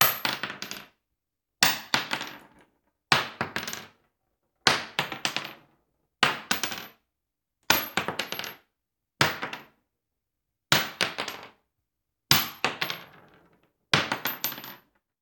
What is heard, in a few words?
Bullet Shell; Sound Ideas; Guns